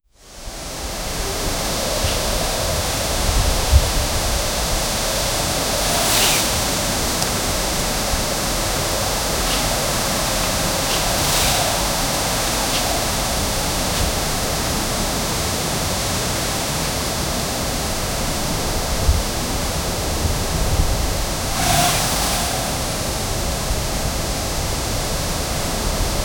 little dragon freedom

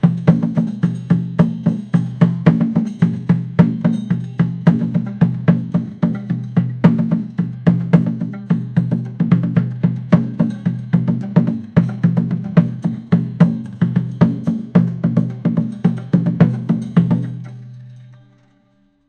soft lively, folk

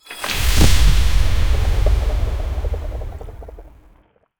cartoon fantasy ice nova

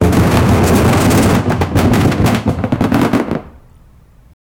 marching band equipment c

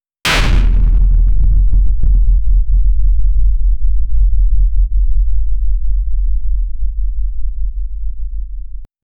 deep powerful, laser

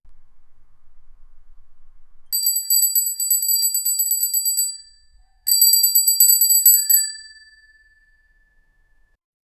a medium bell chiming onl

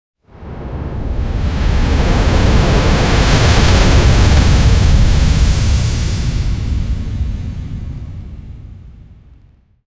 a 12 second elemental pow, energy build up

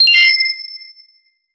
coin collect sound effect

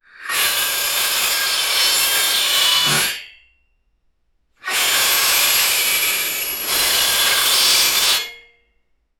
wrench turning sound drill; mechanic

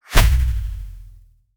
a clean sharp energy burs; dissolve

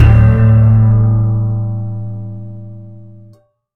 reggae double bass heavy

